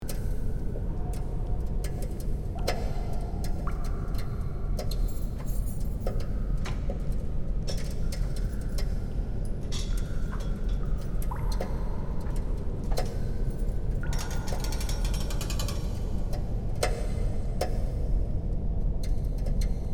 Dilapitated prison ambience exploration loop

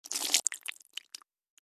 Blood spilling and dripping

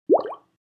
Liquid bubble popping at surface

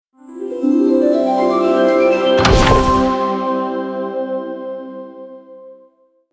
Jackpot reveal suspense casino slot machine

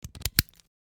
Padlock open and shut; locks keys

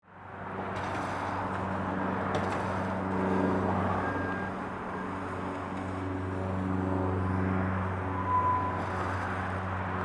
Airport ambience terminal airplane flying over

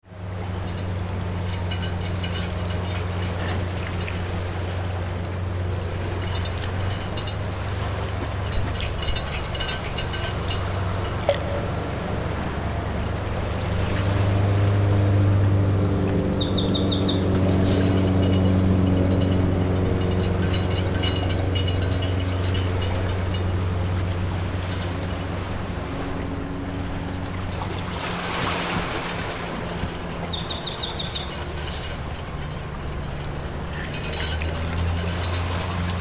Waves crashing on pier ambience loop; ocean

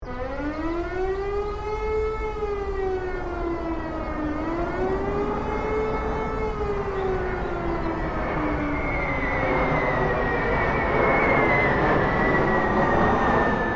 Atomic bomb drop and nuclear explosion; Explosions and Bomb Sound Effects

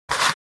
Matches box match striking

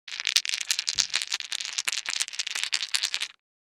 Casino game dice shaking in two hands

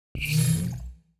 Hologram portal opening closing

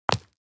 High heels single step on street

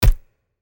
Fight combat game menu ui select

